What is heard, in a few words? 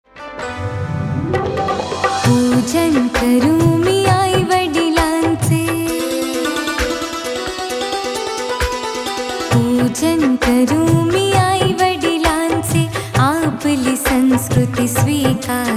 Pushp Fulmala Geet; Poojan Vidhi Tracks Marathi